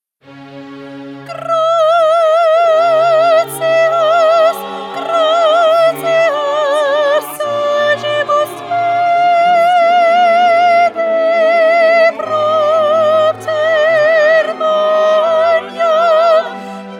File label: mass in c minor gratias 1st soprano; mozart